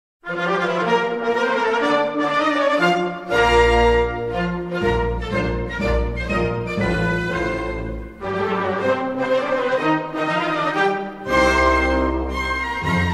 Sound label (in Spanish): himno nacional de brasil